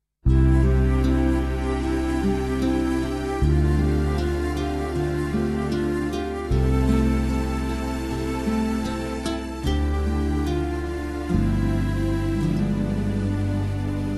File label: Karaoke en DO M